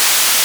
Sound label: noise